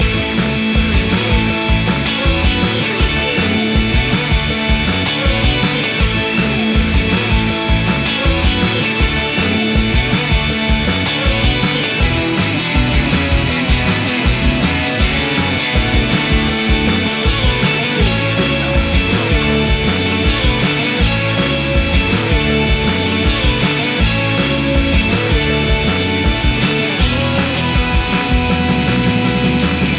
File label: maximum rock n roll loop